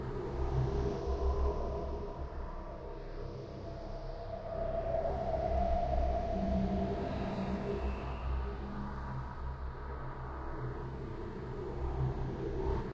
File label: red deep jungle